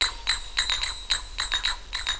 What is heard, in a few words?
rope beat; winworld